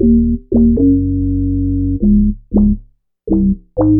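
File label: Organ Funk, Keyboards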